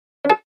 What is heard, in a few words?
connected